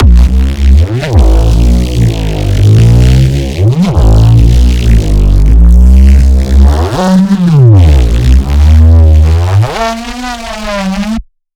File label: weird sine, bass